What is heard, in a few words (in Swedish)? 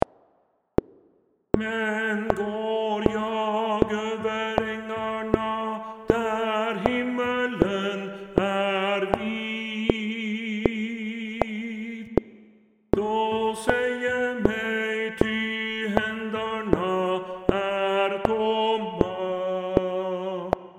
Bass